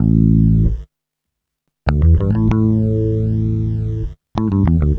BASS, BASS GTR

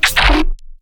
glitch stab; Virtual Riot; Glitch Stabs

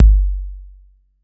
BD 808

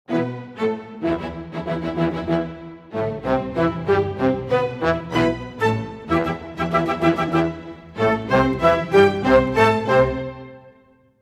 95 e min 4 bars full mix, orchestral arrangements

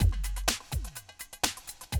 125, Classic Drum Loops; Best Service; XXL 1500 Classic DrumLoops; Sample CDs

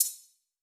DRUMS Super amazing hat

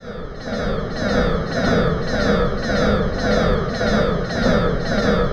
alarm; Zero G; Malice In Wonderland

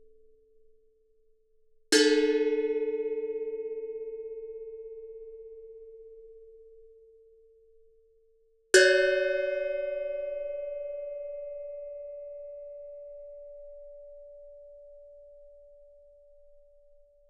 Gamelan; Propeller Island - Complete Gamelan

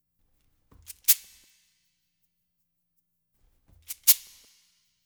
LOOP, SHAKERS, Percussion

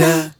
Dah E3, Lounge Hitz

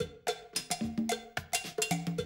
110, Classic Drum Loops

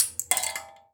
Finger Gesture, Finger Prepared Kit

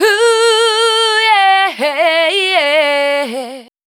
FEMALE, Dance Vocals; Best Service ProSamples vol.12; AKAI

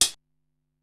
HiHat Closed Low, Korg Wavestation-SR, Drum Kit; Wave 455 - Drum Kit

